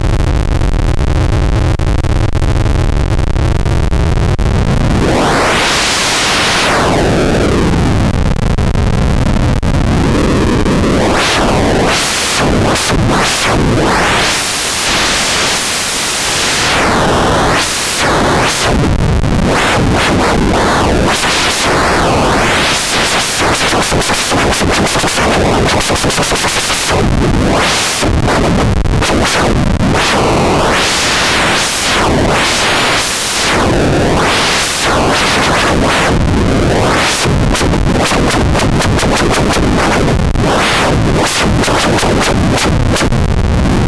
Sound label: noise freq; audiocubes